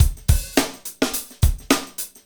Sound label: GROOVE